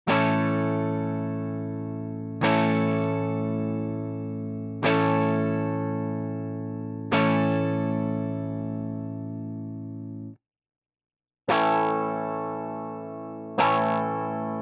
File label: WGS British Lead